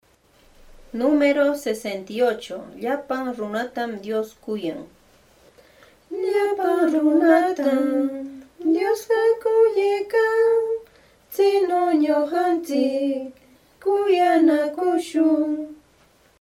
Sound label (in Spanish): cancion